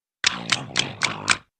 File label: dog biting self